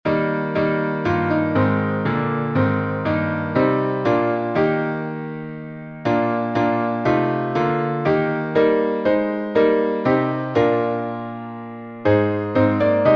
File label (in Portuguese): salmo playback